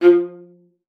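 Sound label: F5, viola spiccato; Xsample VOL-5; 90 s Sample CDs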